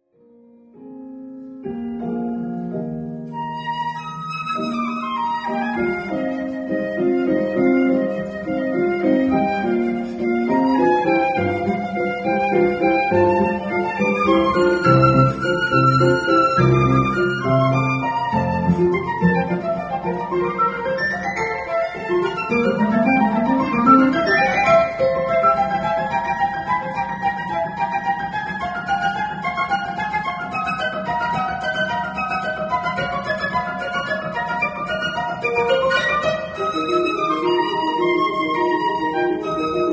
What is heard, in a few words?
Sonata for Flute and Piano; Martinu